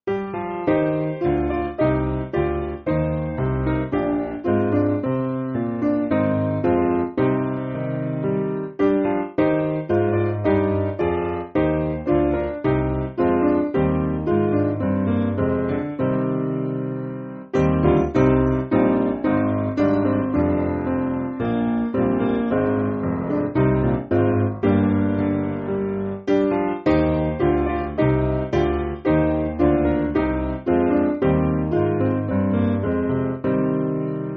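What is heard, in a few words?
Piano, Music Backing Files for Churches; Durrow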